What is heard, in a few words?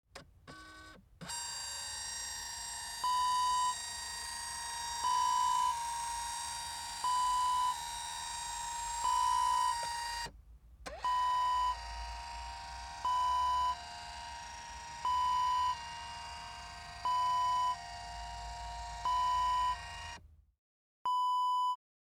computer scanner